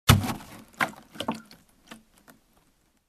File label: Object dropping in liquid gas